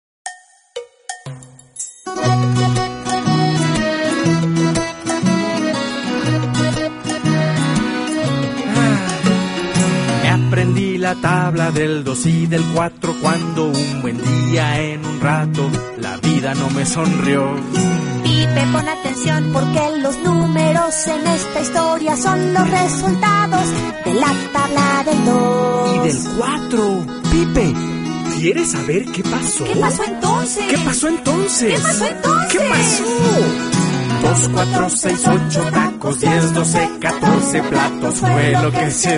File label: pipe